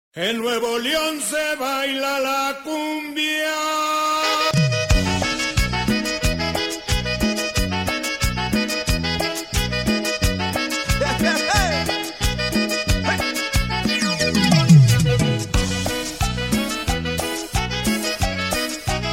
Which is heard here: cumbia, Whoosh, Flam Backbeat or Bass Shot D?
cumbia